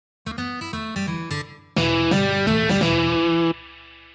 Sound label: blues guitar